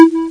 Got Energy; Asterax

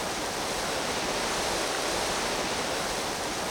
one bzzt

